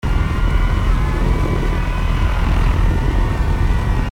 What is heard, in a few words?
voidling laser loop